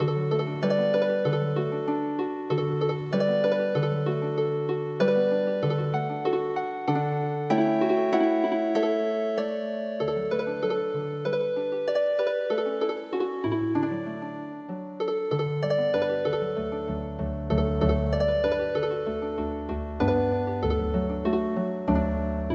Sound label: mamemaki Healing Harp